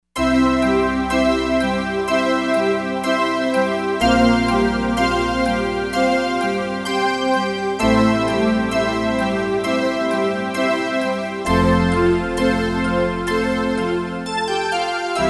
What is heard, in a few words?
D-50 Stack